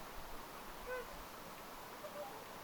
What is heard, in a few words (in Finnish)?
laulujoutsenen aani